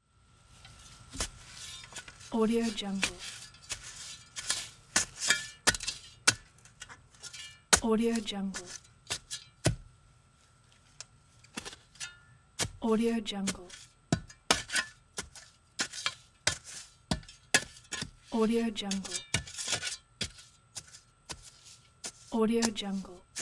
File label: gardening with shovel chopping digging